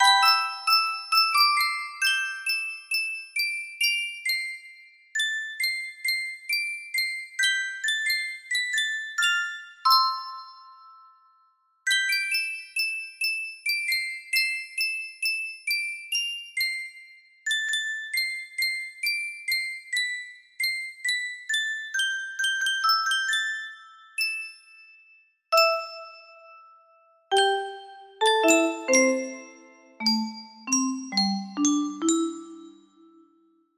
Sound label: music box cover; adventure time